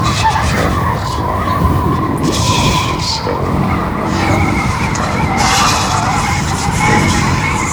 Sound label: Sand Storm loop